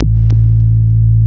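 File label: wall moog, bass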